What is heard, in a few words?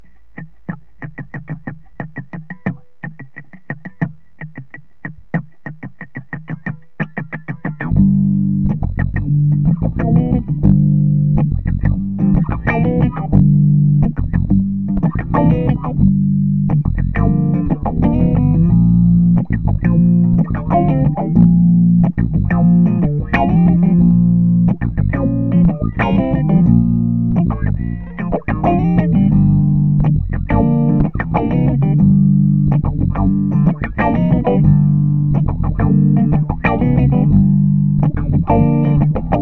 G min Funky Riff